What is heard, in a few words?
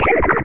idle, roller mine; hl2